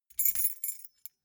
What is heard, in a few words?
key ring small rattle air